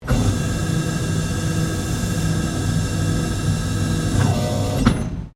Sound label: Door Automatic Servo Driven Close Long Stereo, Motor driven automatic lifting door; Miscellaneous Door